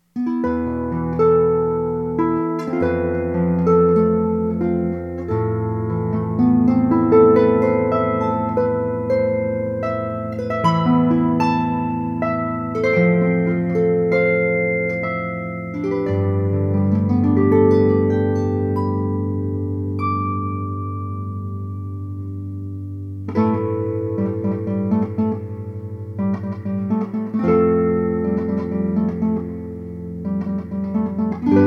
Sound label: Electric Harp